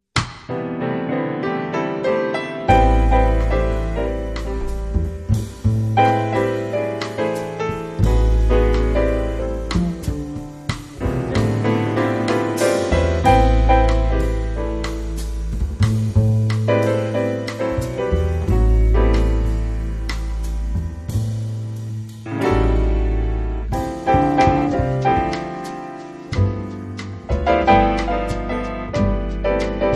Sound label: samba jazz trio